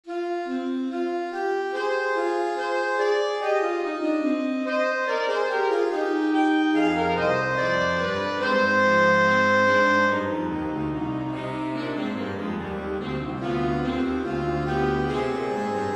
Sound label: Canzona per Sonare; G Gabrielli